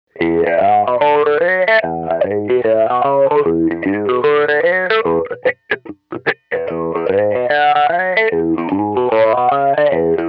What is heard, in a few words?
formant, mobius